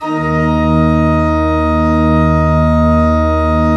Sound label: D1, Cathedral Organ, MANUAL PLENO; Propeller Island